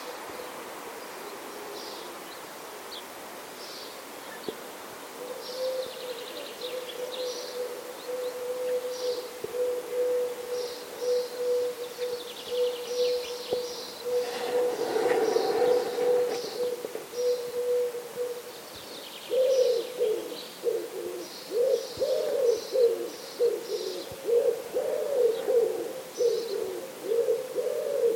tourterelle turque moineau

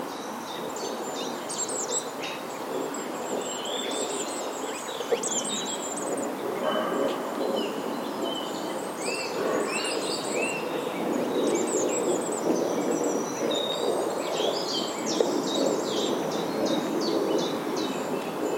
roitelet huppé; f ville evrard

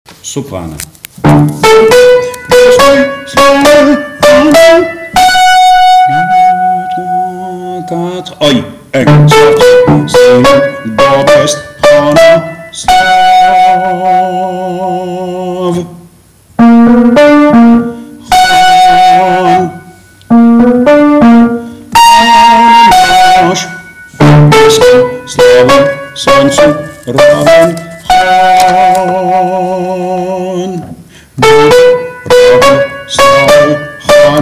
sopranes suite

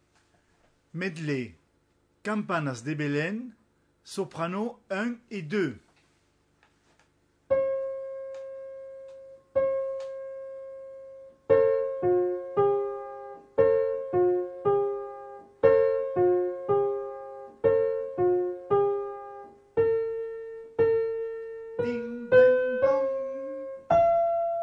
soprano; campanas de belen

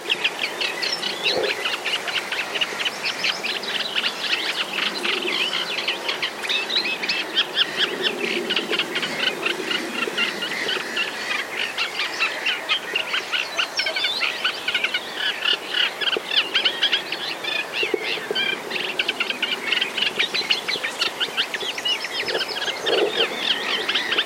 rousserolle effarvatte

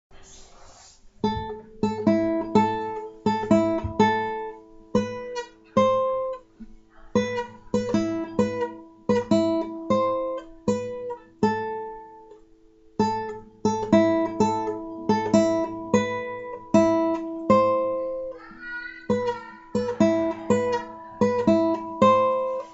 premiere melodie

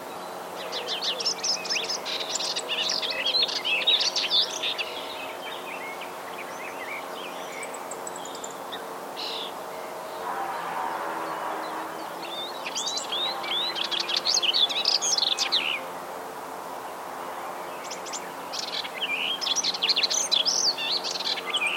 hypolais polyglotte

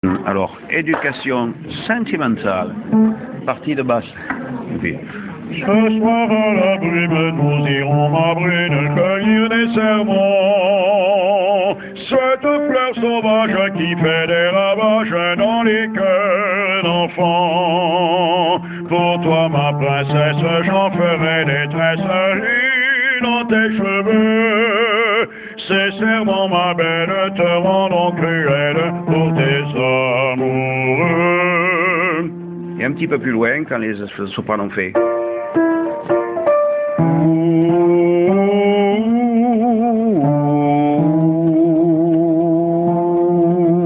basse ce soir

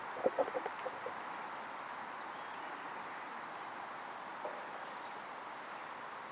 mesanges charbonnieres

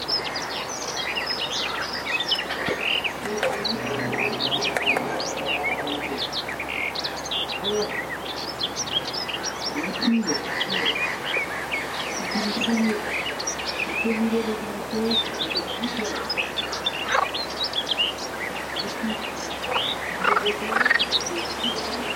fauvette des jardins

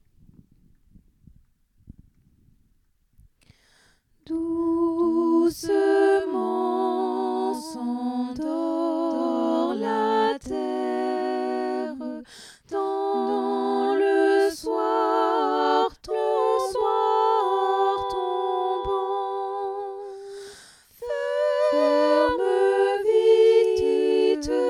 berceuse cosaque tutti

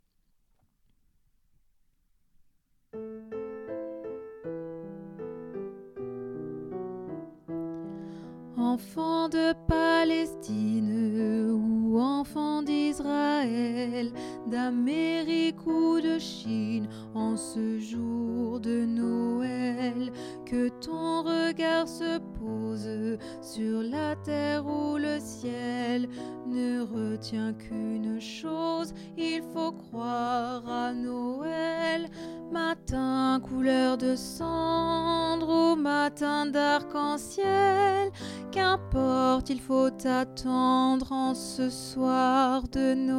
noel des enfants basse

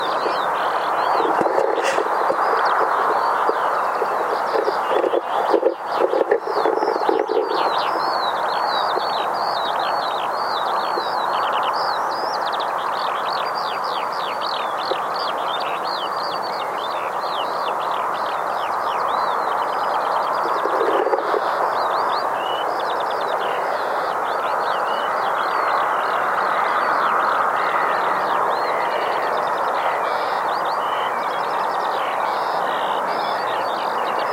alouettes champs; fresnes smarne

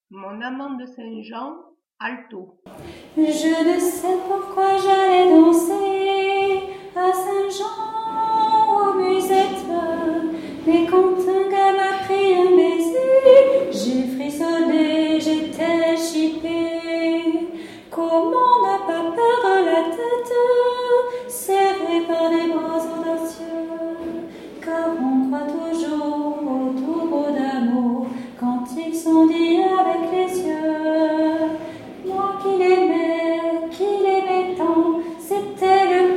alto

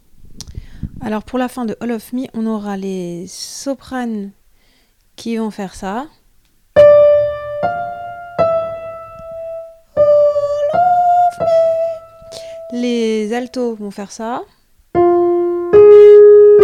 voix par voix

